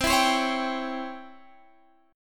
C sharp Minor Major 7th Flat 5th C, guitar chord

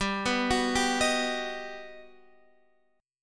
G Augmented Major 7th, Guitar, Standard; G+M7 arpeggiated; JGuitar

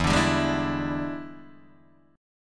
E 7th Sharp 9th Guitar Open D, chord

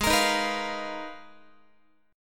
G sharp Minor 6th Add 9th, Guitar; strummed; x,16,13,13,15,16; 4A,4E,5A,5D,5G#,6C#; G#m6add9

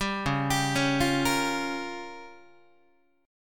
C sharp 6th Flat 5th C sharp down Guitar; arpeggiated; C#6b5; JGuitar

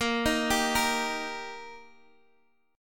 D sharp Major A sharp, chord

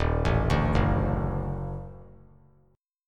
D sharp Minor Major 7th Flat 5th D sharp; arpeggiated

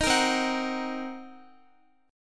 C Minor 7th Flat 5th Guitar, chord; Cm7b5; strummed

